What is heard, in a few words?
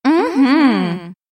"Mmhmm"; Rosalina